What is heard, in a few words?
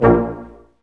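BRS Large Ensemble stac; Roland L-CD702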